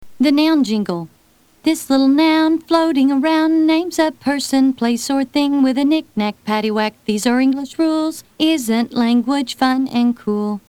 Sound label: noun jingle